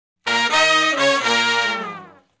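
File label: Shuffle Blues Riff (Bb) unison falloff; Sections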